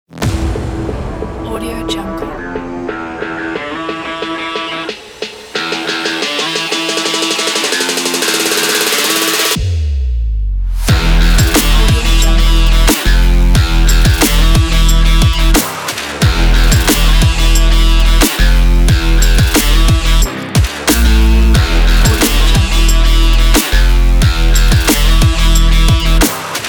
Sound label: trap workout